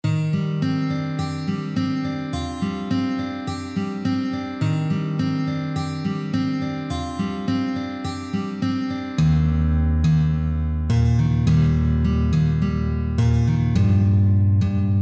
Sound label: guitar; chrono trigger